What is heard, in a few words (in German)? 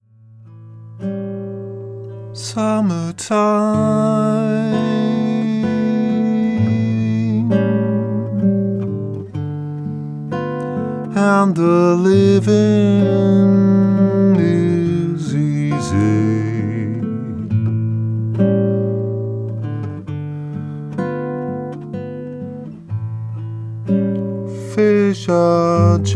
Gitarre Gesang; Sprecher in Bornheim; Muttersprache Deutsch